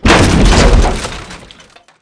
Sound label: catap die